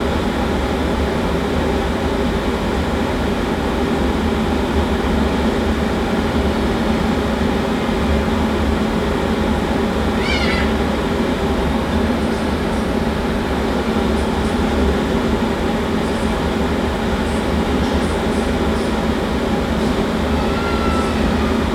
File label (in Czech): odjezd vlaku, dite, hlaseni